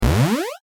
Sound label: jump